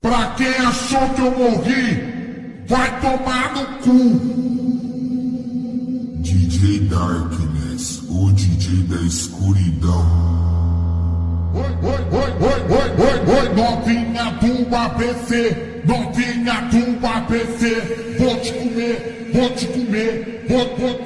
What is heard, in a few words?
English Ringtone